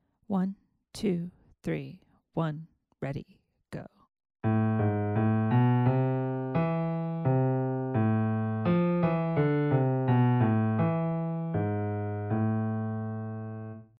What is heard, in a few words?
melodic dictation